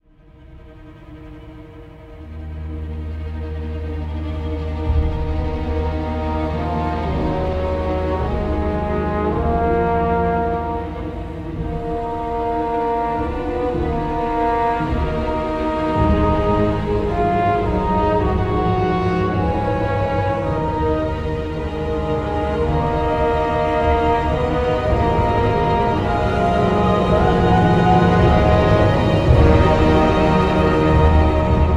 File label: dark; kingscrest; Heroes of the Storm